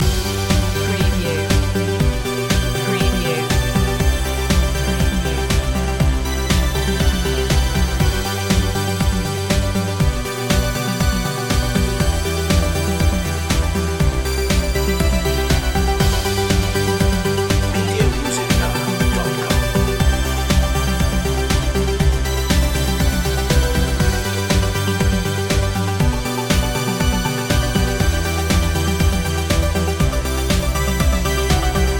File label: hokkaido loop; shiny